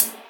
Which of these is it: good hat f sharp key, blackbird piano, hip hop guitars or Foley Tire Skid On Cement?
good hat f sharp key